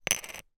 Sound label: foley token plastic down spin surface